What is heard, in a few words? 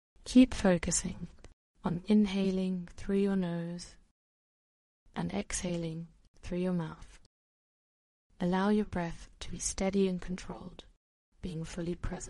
inhale and exhale; Panic Breathing